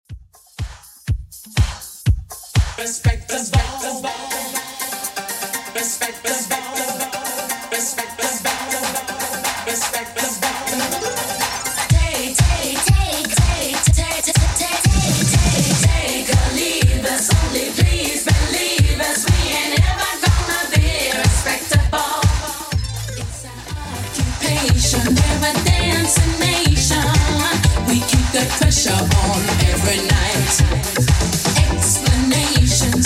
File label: 80s Redrum 122bpm